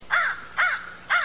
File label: crow, birds